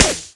syringe gun shoot, weapons; tf

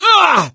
hurt major, gambler, survivor voice; source engine; left 4 dead 2

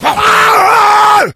rage at victim, infected, male

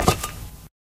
black tile, footsteps

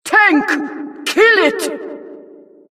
tank alerts; mvm; source engine; tf

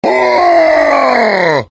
cheers; heavy; source engine; tf

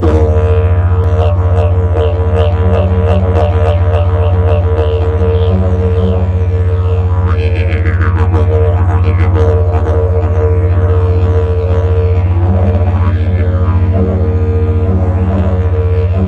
didgeridoo start; video games; player; taunt; source engine; tf

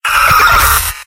scout pain severe; mvm

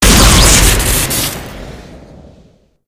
rocket shoot crit, weapons; tf

